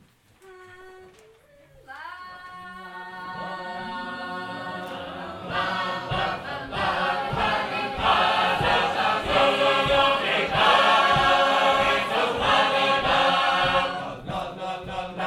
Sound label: Calvary, sacred harp